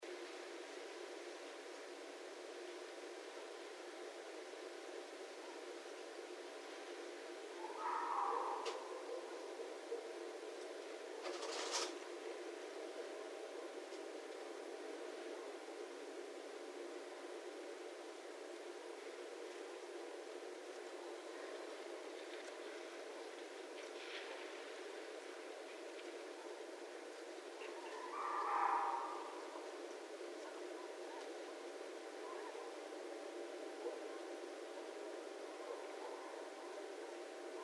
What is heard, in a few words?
Red Fox